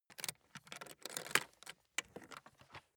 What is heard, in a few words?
aluminium case open buckle